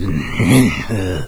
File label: zombie sight; doom3